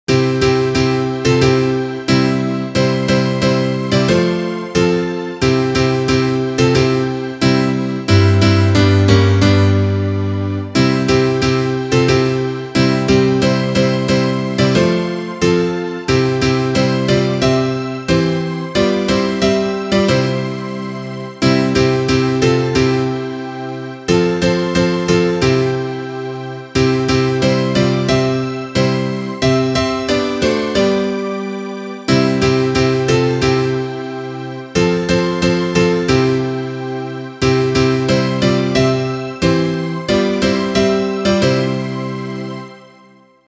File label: instrumental